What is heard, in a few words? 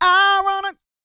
GOSPEL